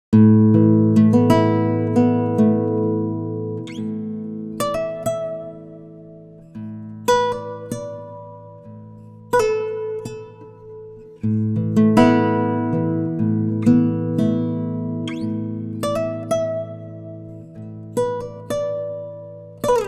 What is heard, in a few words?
acoustic plate; u-he uhbik